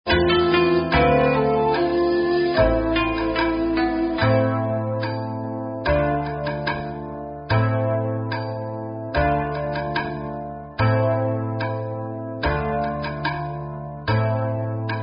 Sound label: Karaoke Songs; Girl Scouts